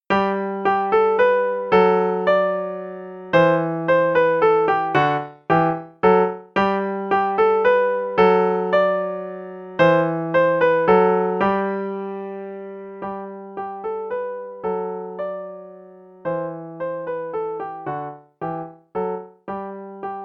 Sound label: Minuet, no click, qn=112; Level 2B Performance